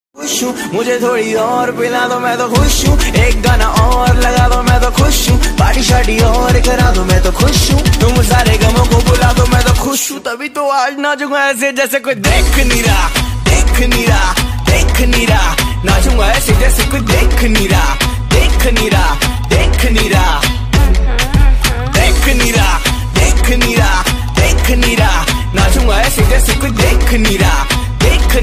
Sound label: Jatt Punjabi Ringtone